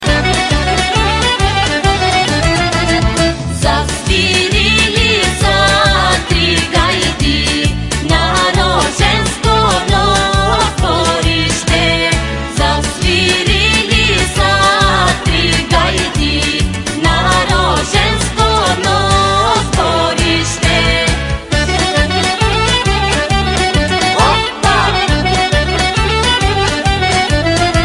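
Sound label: Bulgarian folklore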